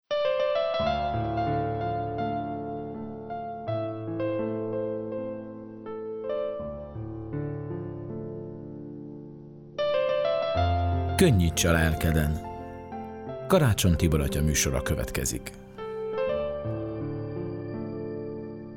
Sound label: Jingle; Konnyits a lelkeden